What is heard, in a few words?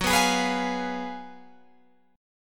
F sharp Major 7th Suspended 2nd Suspended 4th, Guitar, Open G